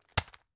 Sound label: tissue package drop hard